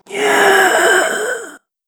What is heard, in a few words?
gasping zombie; mixkit